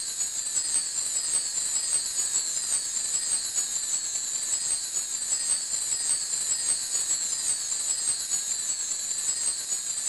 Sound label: Amb Glitter gold